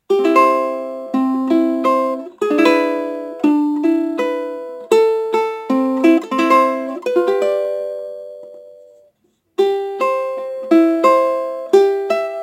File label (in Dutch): muziekje